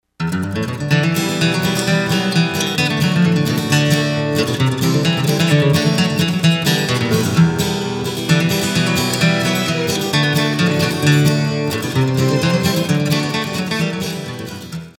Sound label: country musicbed